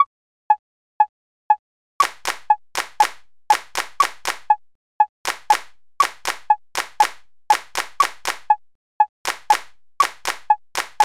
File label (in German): viertel achtel ueb 120, rhythmik